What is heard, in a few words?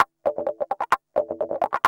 GUI, LICKS; 130 LICKS